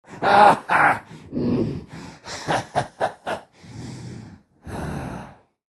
laugh; dota; clinkz

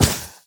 arrow; weapons; dota; medusa

